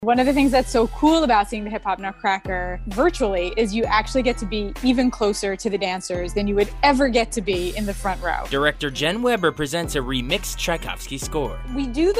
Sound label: hip hop nutcracker